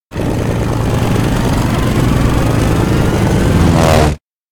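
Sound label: electronic plane runway roll; anemoi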